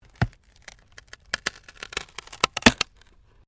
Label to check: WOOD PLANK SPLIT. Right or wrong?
Right